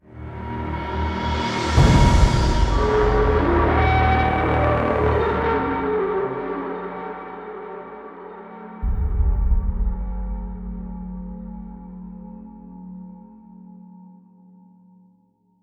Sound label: Stealth Tension, Fight End Tag; Black Sky